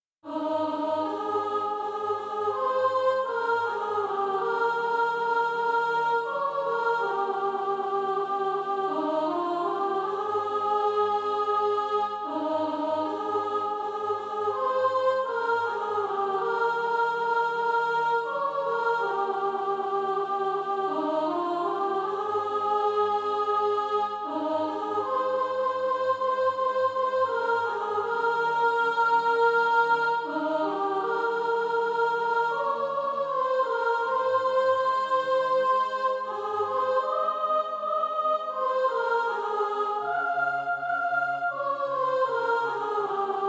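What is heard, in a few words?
soprano, Practise Music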